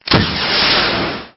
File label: Rocket Launcher Shoot; CastleMiner Z; Xbox 360